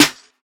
trap snare single hit d key, acoustic snare tuned to the D note